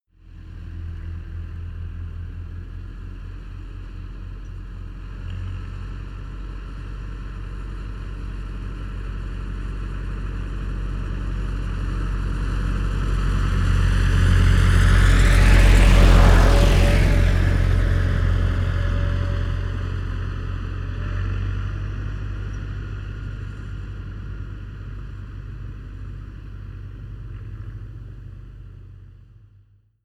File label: pass slow